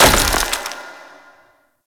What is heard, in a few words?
Worker Ice Hammer Impact; ambience; props; one offs; Warframe; Venus Open World; Venus Solaris United